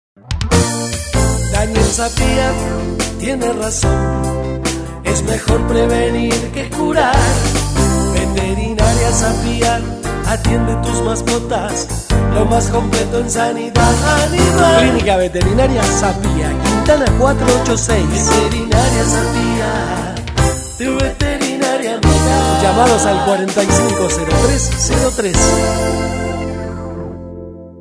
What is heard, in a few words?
jingle